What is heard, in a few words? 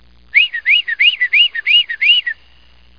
titmouse